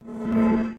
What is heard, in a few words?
warp in